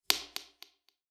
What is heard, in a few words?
Pool ball